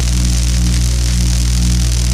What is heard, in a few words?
perimeter electric fence; Source Engine; tf; lair